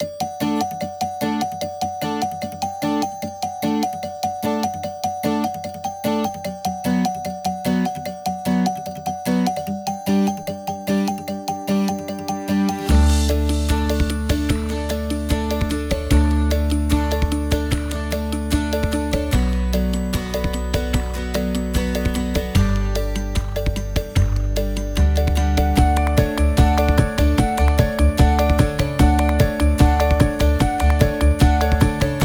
upbeat

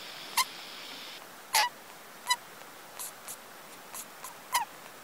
lemming

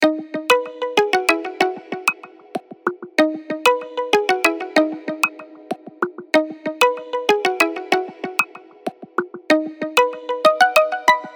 melodic; Рингтоны, звуки будильника, уведомлений и системные сигналы смартфона Alcatel

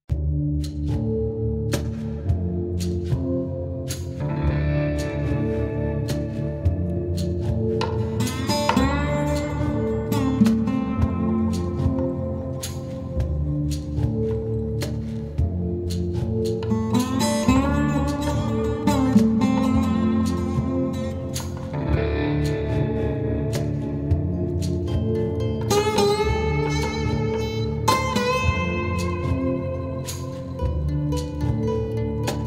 western

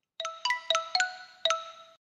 jumping

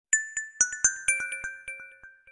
leaf, huawei harmonyos

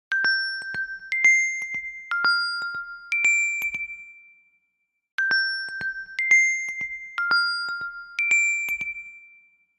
galaxy rising star; samsung galaxy watch

